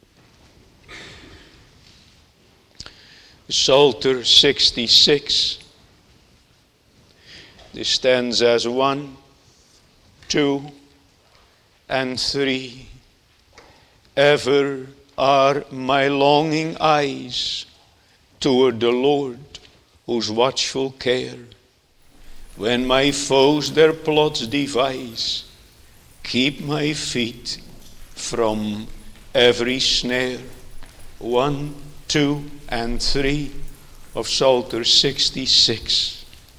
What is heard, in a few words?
Singing; Jesus' Mercy to One Taken with Palsy (2)